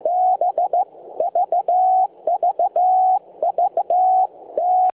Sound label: 40m new tubes on air; 40M TX; ARC5